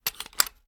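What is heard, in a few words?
foley reload loop load; weapons; gmod; viper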